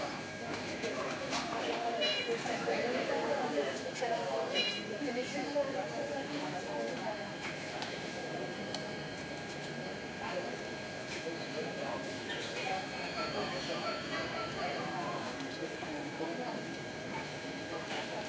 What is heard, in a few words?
office